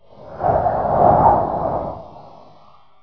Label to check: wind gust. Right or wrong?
Right